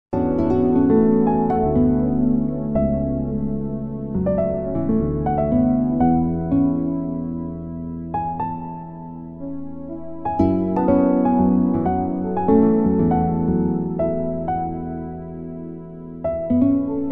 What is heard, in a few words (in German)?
eeg musik